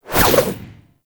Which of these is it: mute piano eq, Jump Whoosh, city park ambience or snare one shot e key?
Jump Whoosh